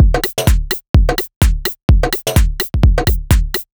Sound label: Rollercoaster 127bpm, House Drumloops; 90 s Sample CDs; Zero-G House Fabrik Drumloops; ZG Drumloops